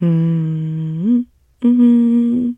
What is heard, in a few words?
trader hum; 商人杰恩（七日杀）